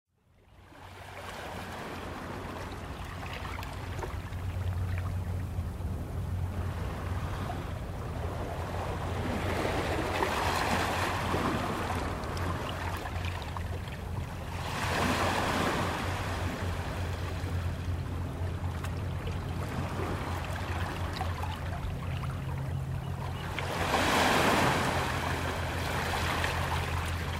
Aquatic Park Waves Close Up

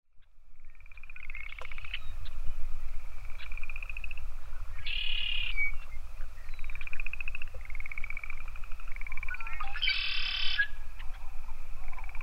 red winged blackbird

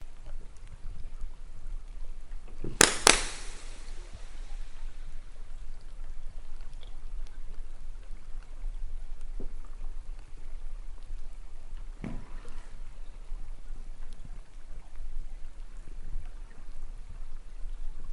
knock fish; GBNP

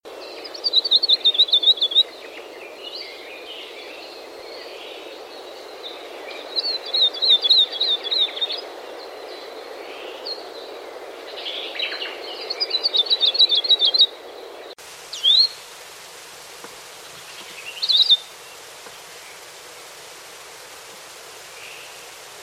Akepa

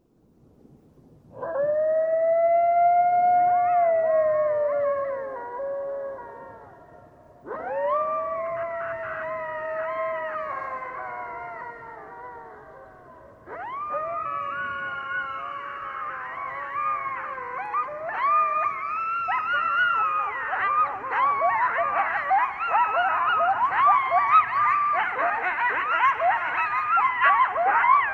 Coyotes

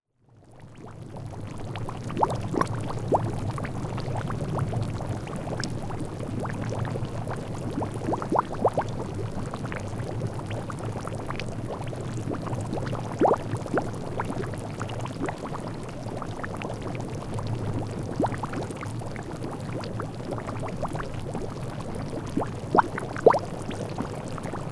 Norris Porcelain Basin Fumaroles Small, yell

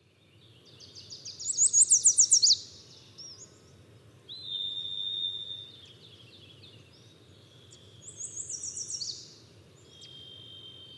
soundscape

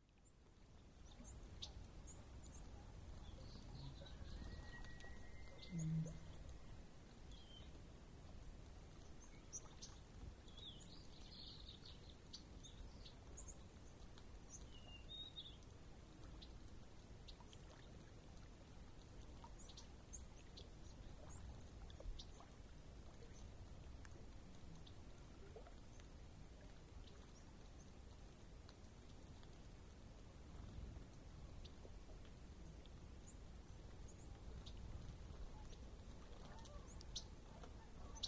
Lake Soundscape

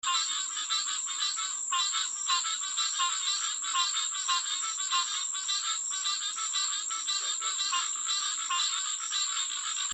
amphibian chorus

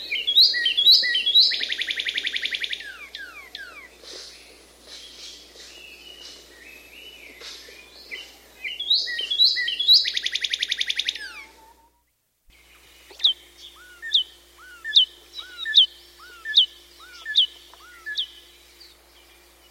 Northern Cardinal

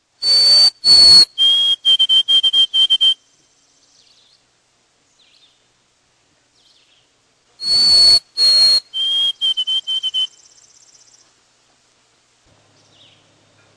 white throated sparrow

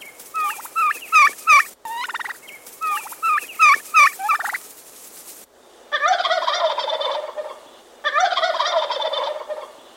Wild Turkey

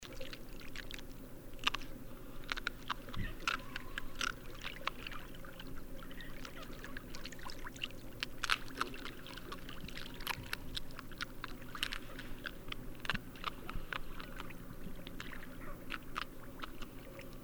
sea otter

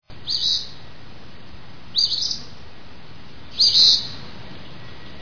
Eastern Phoebe

